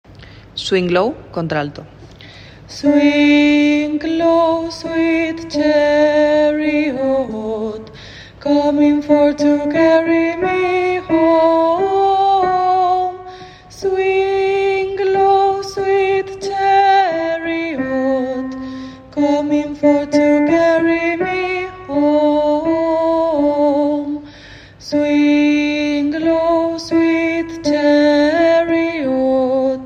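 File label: alto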